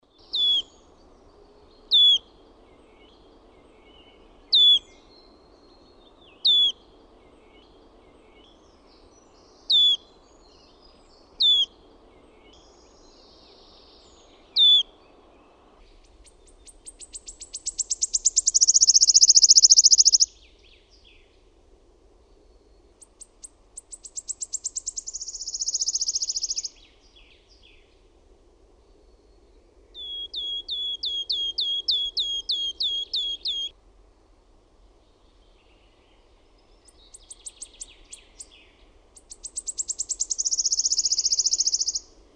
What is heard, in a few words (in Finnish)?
SIRITTAJA, Linnut; OMAT AANITYKSET